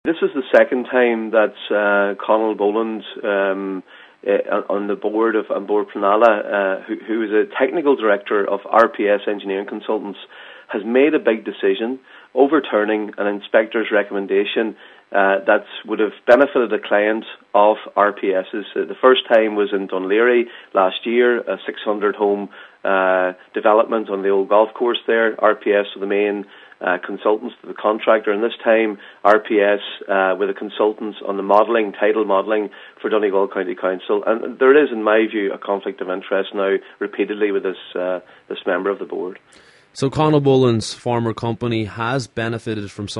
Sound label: pad